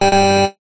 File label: multimedia game tone retro positive complete bright